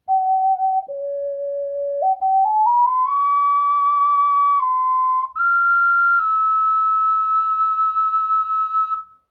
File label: ocarina